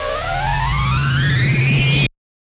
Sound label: Swift Rush Charge; SoundsCrate